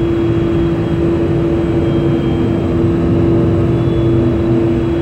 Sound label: ship engines; World Of Warships